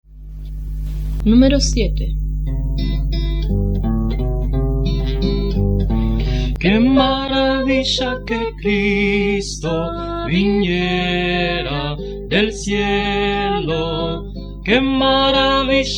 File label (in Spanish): Coro, himnario niños